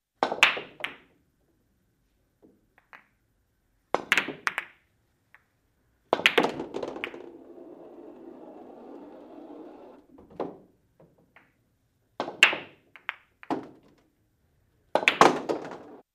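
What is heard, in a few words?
5 soft hits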